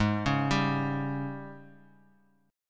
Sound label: G sharp Major 7th Suspended 4th; arpeggiated; x,11,11,12